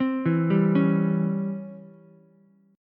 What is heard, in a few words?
Db Minor Major 7th Flat 5th Db down Ukulele